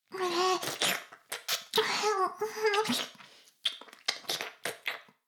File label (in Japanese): 耳舐め音声 (LR逆); 古き城のドラゴニート2